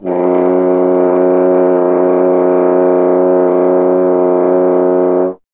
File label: F. Horn; Miroslav Vitous; Solo Instruments VOL-2